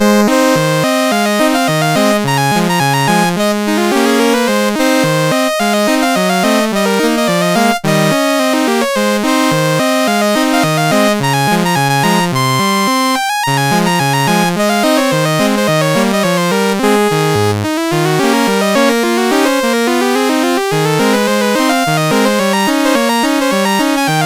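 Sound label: River Chase, MOS 8580 SID chip music, games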